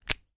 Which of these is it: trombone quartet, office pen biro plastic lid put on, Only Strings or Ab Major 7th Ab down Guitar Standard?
office pen biro plastic lid put on